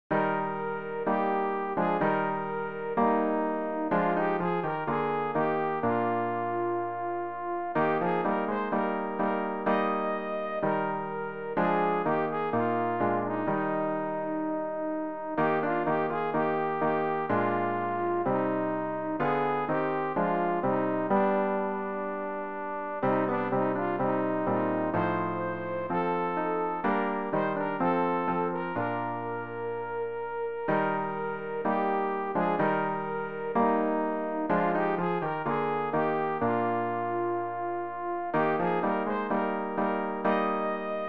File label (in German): sopran; Ref. Gesangbuch; RG 485: Dir, Auferstandner, sei der Lobgesang